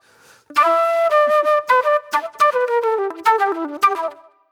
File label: Agro Fusion Flute; Sonic Foundry (Sony Creative Software); Manhattan Flutes; Garage Funk